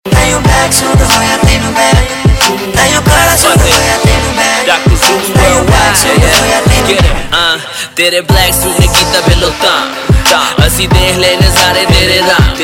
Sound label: Ringtone, Punjabi